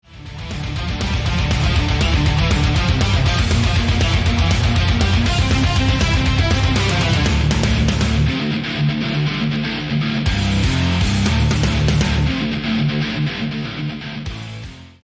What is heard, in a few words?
metal